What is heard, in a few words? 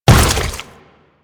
Step Goo, Footsteps; Warframe; Grineer